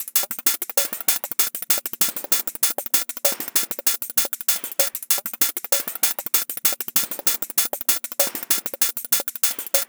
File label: PERC LOOP